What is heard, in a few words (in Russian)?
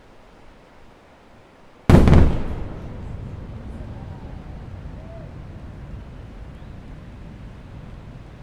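звук приземления ракеты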